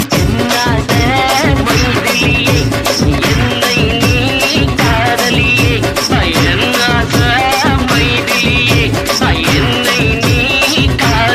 cool tamil remix ringtone

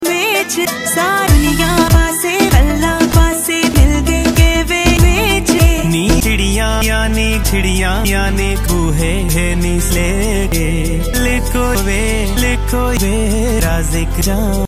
punjabi ringtone

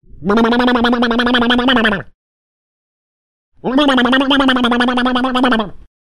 russian ringtone

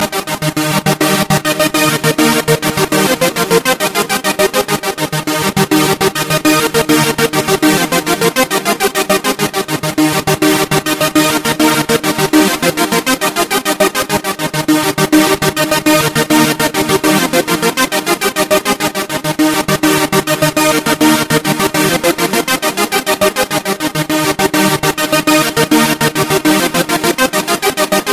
new iphone 7c ringtone

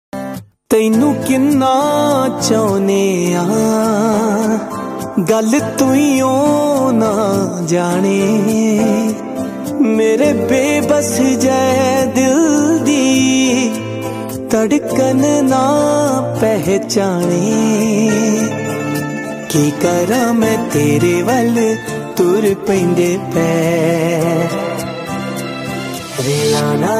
punjabi ringtone

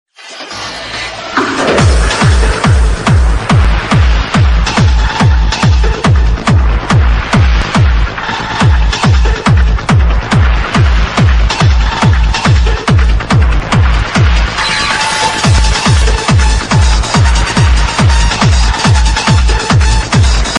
pop ringtone

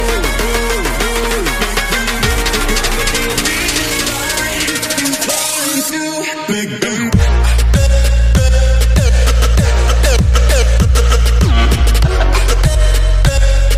top english ringtone

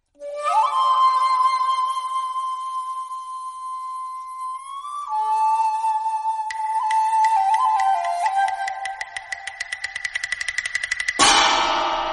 japanese tradition ringtones

